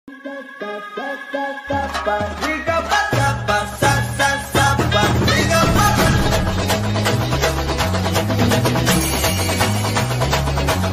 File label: mass bgm mass ringtone; premam